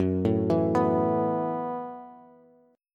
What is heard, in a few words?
Gb Minor 11th Gb Bass Bass Standard; arpeggiated; JGuitar